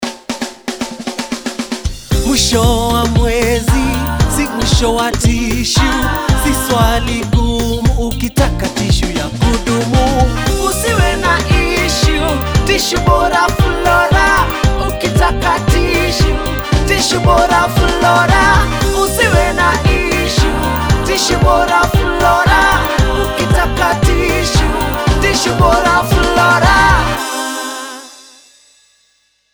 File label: JINGLE; FLORA MAIN